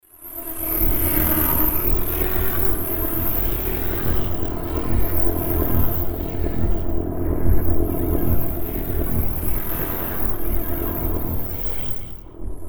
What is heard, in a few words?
generator